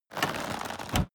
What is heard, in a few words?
crossbow load